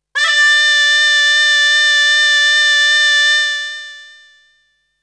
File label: Flute; ZERO G - Datafile DISC 3